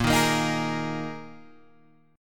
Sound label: A sharp 7th Suspended 4th, guitar